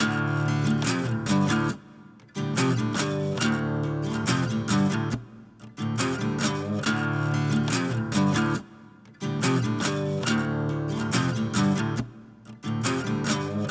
Blues Guitar Rhythm D 70

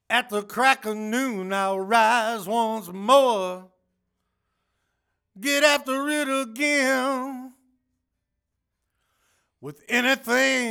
Lead Vox

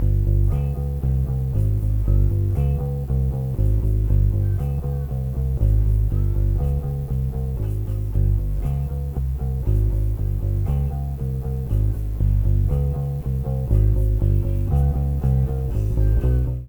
Bass

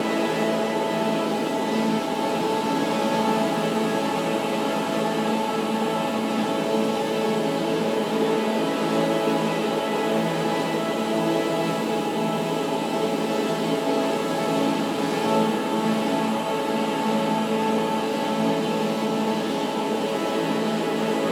A Synth Grains, Granular Synth Loops; IntoTheNight; Hydrosphere

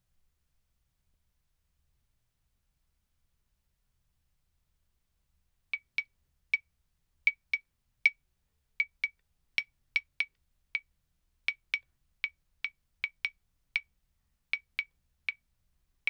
in Eb Claves

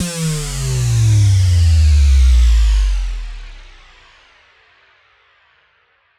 Sub Drop, Synthwave; Synthwave Loops; FallenAngel; Futurism

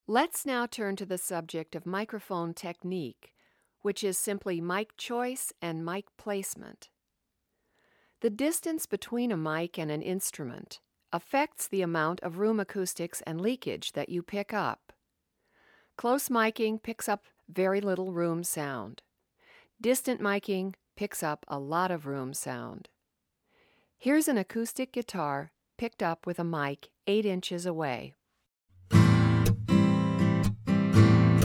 Bartlett Miking Distance; Bartlett Mic Placement; Demo close or far mic location

